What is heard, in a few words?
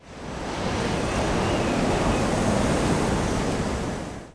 wind release; game; zuqiu